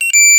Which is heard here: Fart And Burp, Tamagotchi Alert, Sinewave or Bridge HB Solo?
Tamagotchi Alert